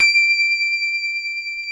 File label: PIA MICRS, MICRO-PIANO